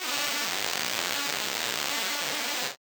Casio, Glitched, malfunctioning synth

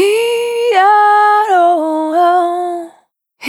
Spin Dry, Single Voice Melodic Loops

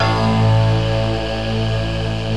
MULTI SYNTH

